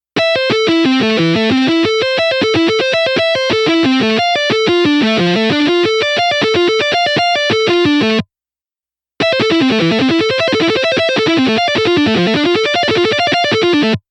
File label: Creative Arpeggios Example